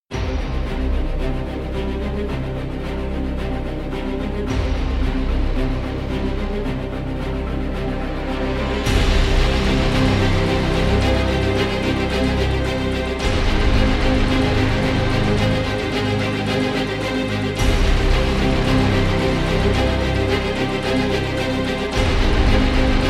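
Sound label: alarming music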